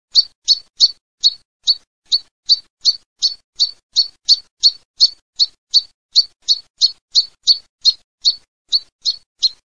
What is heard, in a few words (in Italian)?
BALIA NERA